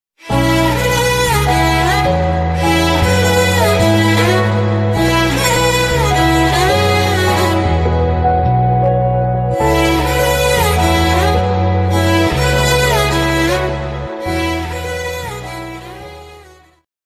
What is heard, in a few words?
violin bgm; premam